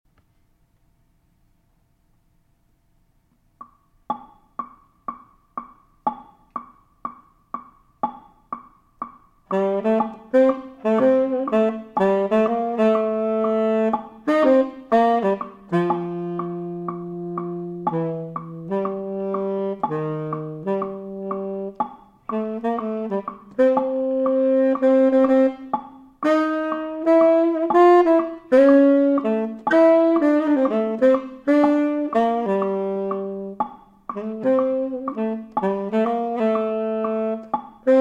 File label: jazz tenor swing